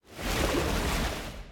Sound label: lobby water; smite; cthulhu